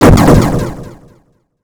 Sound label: thunder; sigurdrshavoc